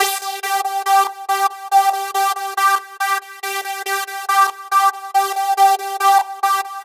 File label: Arp 140bpm G, Trance; Arps; Zero-G Trance Inducer; 90 s Sample CDs